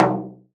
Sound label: Darvul